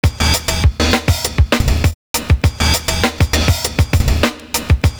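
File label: CRASH MOOD, 100 bpm; Univers Sons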